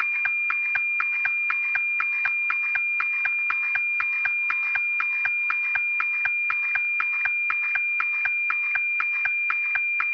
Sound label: noaa17; noaa17 reзu parfaitement